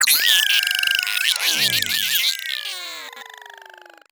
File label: egg jump; player; Portal 2; eggbot